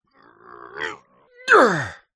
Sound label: human male voice grunt groan lift heavy weight